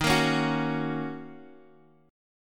Fsharp 6th, guitar chord

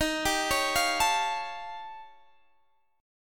Eb 9th Flat 5th, Guitar, Standard; Eb9b5; arpeggiated; x,18,17,18,18,17; JGuitar

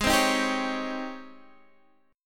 G sharp Major 11th, Guitar, Half Step Down

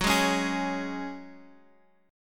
Gb Suspended 2nd, Guitar, Drop D; x,x,4,1,2,4; down; chord shape

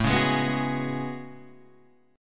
A Major 13th, down Guitar Half Step Down; AM13

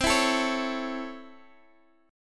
C sharp Minor Major 7th Sharp 5th, chord

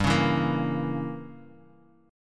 G Diminished 7th G, Guitar Open D; 5,4,2,4,x,x; down

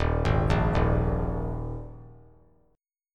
D sharp Suspended 4th; D#sus4 arpeggiated; JGuitar

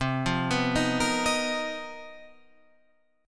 B Major 7th Flat 5th C; arpeggiated; BM7b5/C; JGuitar

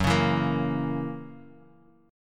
F sharp 7th, Guitar, Open D; 4,4,2,4,x,x

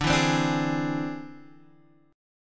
E Augmented 7th D

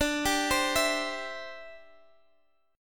C Major D

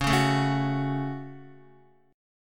C sharp Suspended 4th Sharp 5th, Guitar; strummed; chordshape

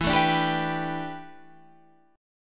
A 9th Suspended 4th E

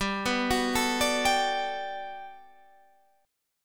G Major 9th, G, down

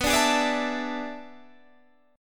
A Major 7th Suspended 2nd Suspended 4th

